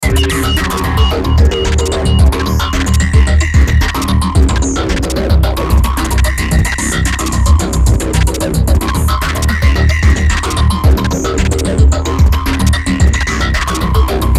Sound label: BEAT BUZZSAW, Beat Buzzsaw Electronic; Miscellaneous Loops; Blastwave